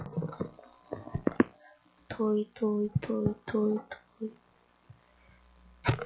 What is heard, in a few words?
toy